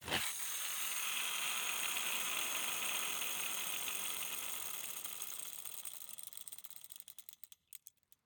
Rollerskates, old fashioned, all metal, wooden wheels, swipe, spinning, slow to stop, light; Eiravaein Works; Vaeyan IV